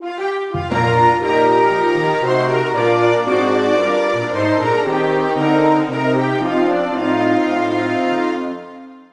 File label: Anthem Vietnam; Buzz! The Mega Quiz; PS2